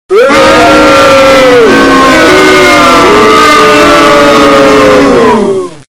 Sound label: boo